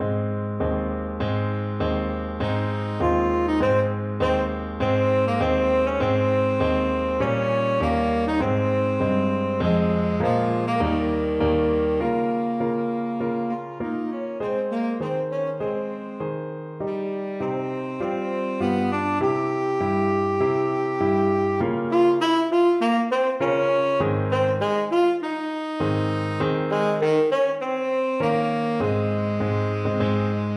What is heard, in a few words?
tenor, saxophone; bizet torreador song